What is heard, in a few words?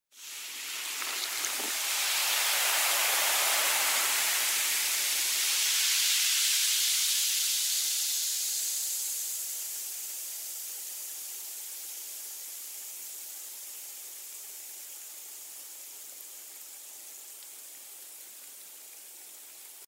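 shypenie gazov gazirovki, hissing of soda gas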